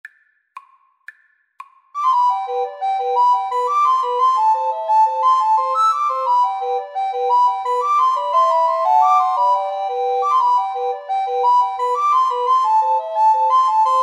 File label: irish washerwoman, Recorder Trio